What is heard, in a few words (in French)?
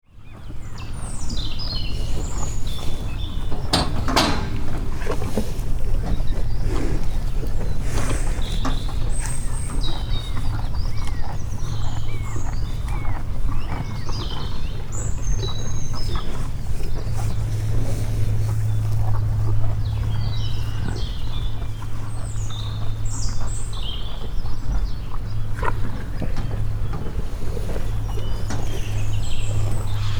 Hautefort, Déjeuner des vaches, vue sur le château.; Point d’écoute : Hautefort